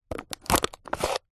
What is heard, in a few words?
plastic disposable cup stab with knife